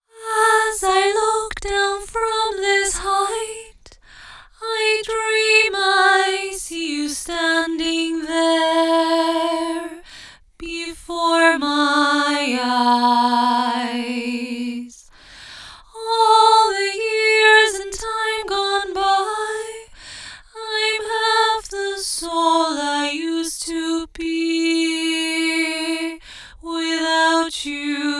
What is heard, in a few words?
Harmony Vocals - Fm 60Bpm; Construction Kits; Ghosthack; SkyRealm; Kit 04 - High Rock View